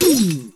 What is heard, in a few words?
Break Ball; HeavenStudioPlus; working Dough